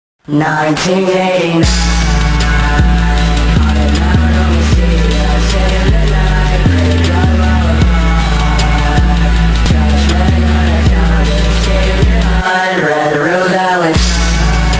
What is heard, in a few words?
music